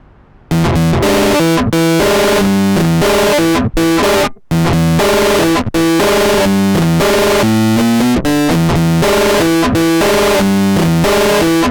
MOD Devices MOD DUO X Epiano MOD BigM Hardware Dist